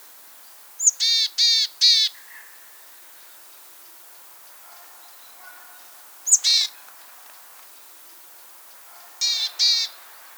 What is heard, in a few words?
willow tit call